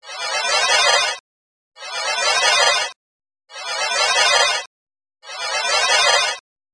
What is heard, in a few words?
Ufos